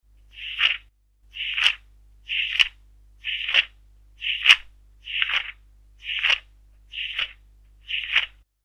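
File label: page turning sound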